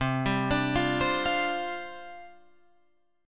C Suspended 2nd Suspended 4th, down, Guitar, Drop D